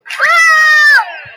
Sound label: scream